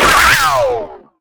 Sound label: turbo; Toon Car